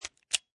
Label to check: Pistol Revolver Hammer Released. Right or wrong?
Right